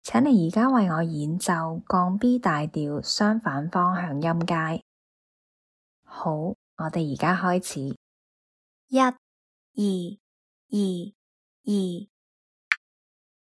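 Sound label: b flat maj contrary motion scale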